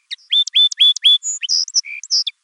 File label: song sparrow more attractive; by stephen nowicki and susan peters